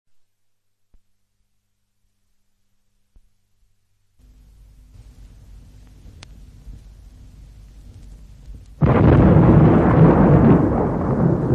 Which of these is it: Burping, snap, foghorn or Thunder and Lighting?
Thunder and Lighting